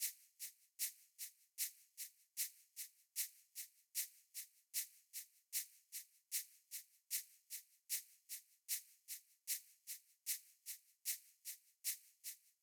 Drum Loop, 152 BPM Shaker; Cymatics; Vibes II Drum Loop Collection; For You Drum Loop

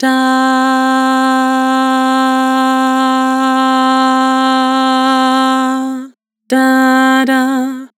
Vibrato Dah, Vocals; Harmor Vocals

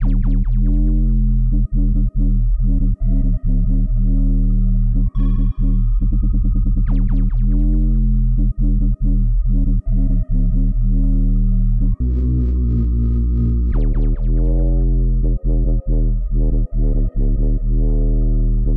140 BPM D# Min, Melodies, UK Garage; Speaker

